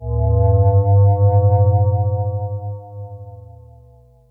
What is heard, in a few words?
fly in; toystory; buzz